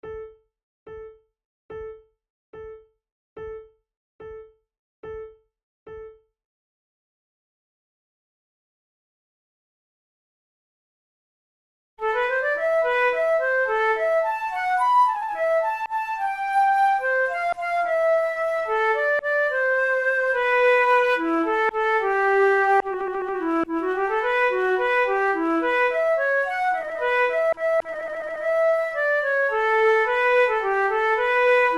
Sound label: for two flutes litalienne; Monteclair; 6 concerts; flute tunes